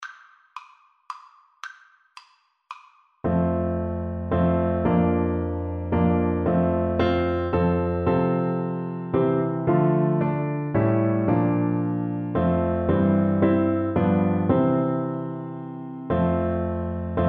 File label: blaenwern FL kar, Flute